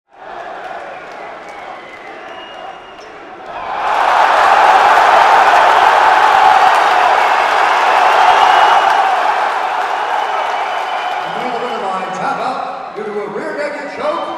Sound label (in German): atmo